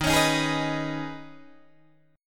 Eb 7th Suspended 4th Sharp 5th, Guitar, Open D

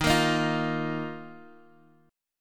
D sharp Suspended 2nd, Guitar, Half Step Down

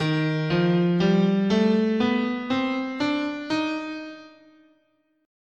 D sharp Lydian Augmented, scale

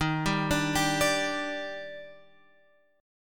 Eb Major 7th, Guitar, Open F; EbM7; arpeggiated

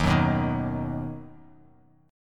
Eb Minor Sharp 5th Eb down; Ebm#5 strummed; JGuitar

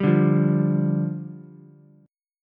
D Minor Double Flat 5th, down, Ukulele

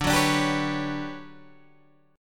D Major 13th D down Guitar Standard; strummed; capo 9

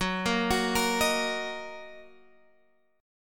Gb 6th Gb

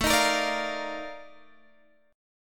F 13th A, chord

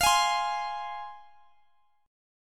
F Minor, down, Guitar, Open G

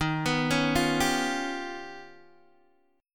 D sharp Augmented 9th D sharp Guitar DADGAD

